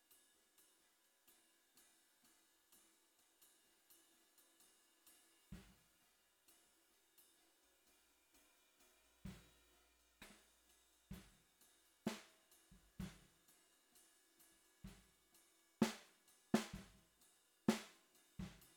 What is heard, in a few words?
SNR Bot